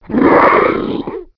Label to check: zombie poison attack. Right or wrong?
Right